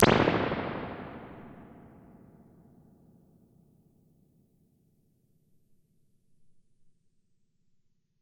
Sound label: mixed springs, impulse responses